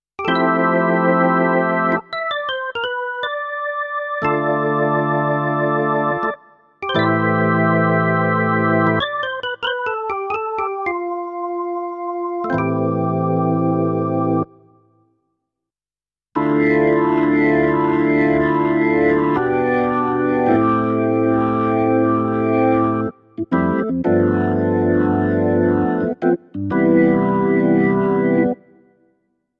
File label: PX-3 Organ